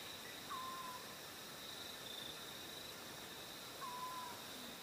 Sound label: Lipaugus-vociferans, پرنده تاریکمرغ جارزن